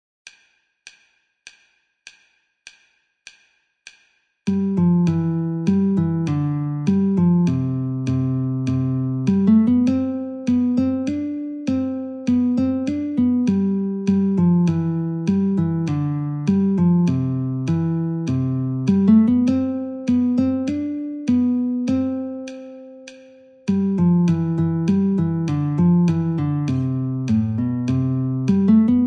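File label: Guitar metronome 50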